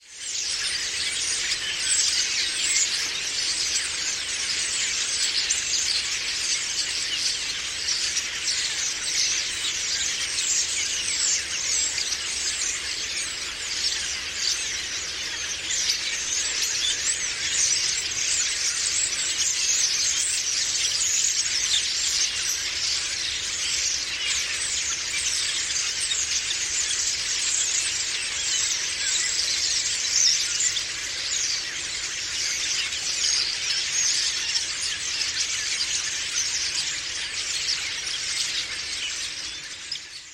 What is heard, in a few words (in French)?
etourneau sansonnet; chant